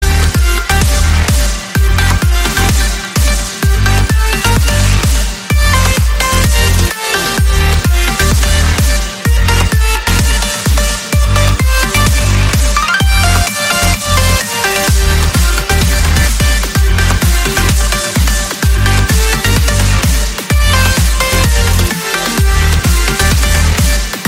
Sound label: new trance ringtone